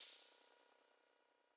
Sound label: CYMBAL, Sound-Master SM-8